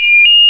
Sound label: alternating, fire alarm; garrysmod